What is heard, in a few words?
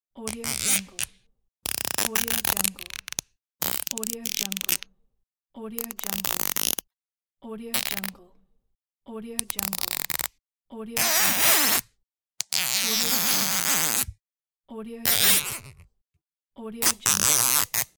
zip tie pack